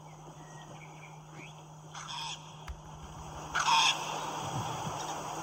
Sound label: Yellow Wattle Bird